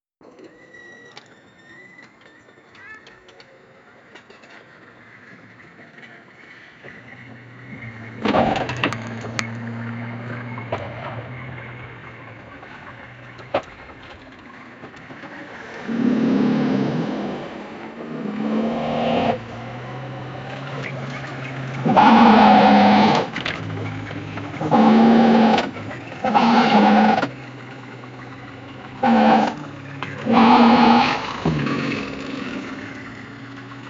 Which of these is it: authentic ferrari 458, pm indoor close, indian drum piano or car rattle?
authentic ferrari 458